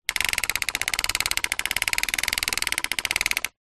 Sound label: leisure toy car push wheels click